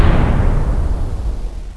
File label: plasma; Nuclear Hammer